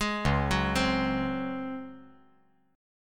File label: C sharp Major 7th Suspended 4th